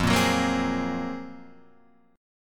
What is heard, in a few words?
Gb Major Sharp 11th F